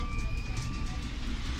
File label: mechanical break, Metro